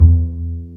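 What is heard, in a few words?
String, contrabass pizzicato; Roland - String Master Series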